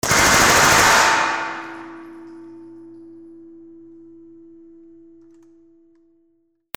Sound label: WEAPONS